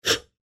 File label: Blowdart Exhale, Blowgun; Survivor Ultimate